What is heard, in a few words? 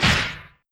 impact; Politburo